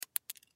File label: Nut Drop Single, Nut Single Drop Bounce Cement; Hardware; Industry; Sound Effects:Machines; Blastwave